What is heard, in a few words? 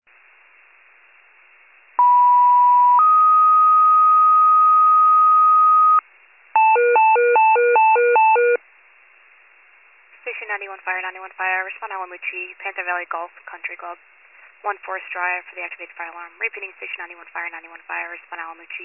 FIRE pager alert